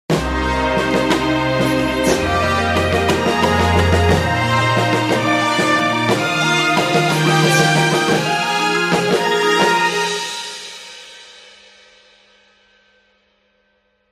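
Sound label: Superhero Presentation orchestre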